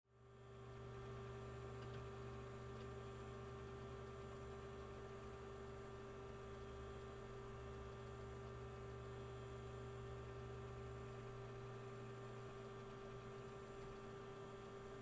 empty room

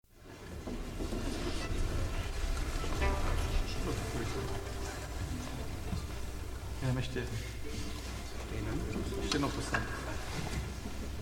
Transitions mix

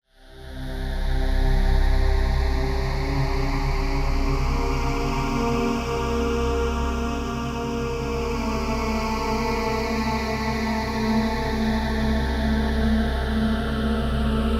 musical haunting

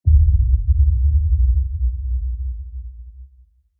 Rumble

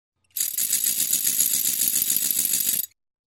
Plastic Crank; Soundzabound

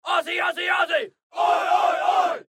soccer hooligan

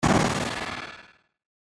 Burst Reverb

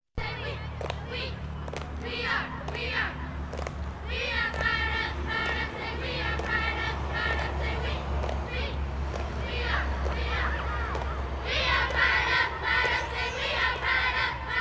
We Got Spirit vocals; Soundzabound Volume 3

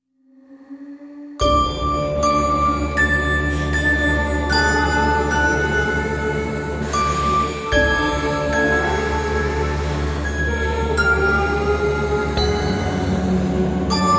Creepy Nursery

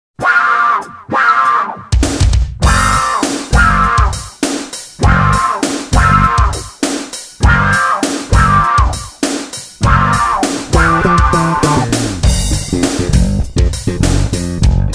Moody Groove; Soundzabound Volume 4

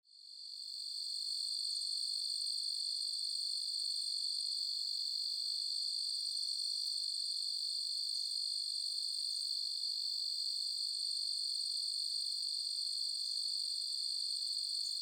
night woods

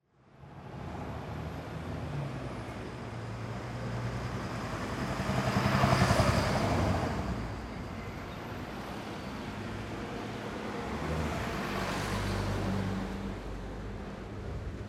traffic

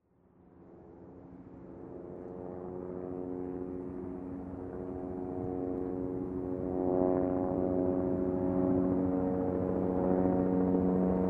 Helicopter

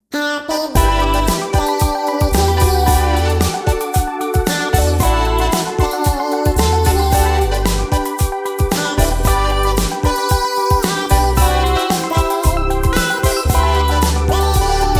happy polka birthday

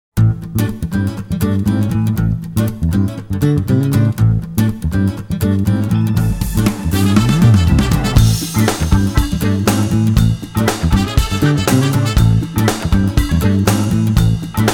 Jazzy Blues; Soundzabound Volume 4

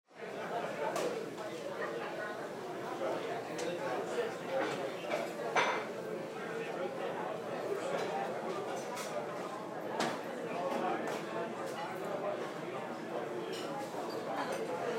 restraunt

